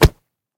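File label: light hit with a carpet beater on a carpet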